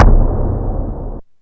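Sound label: DRUMTRAKS low tom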